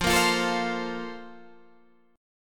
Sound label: A sharp Major 7th Suspended 2nd, chord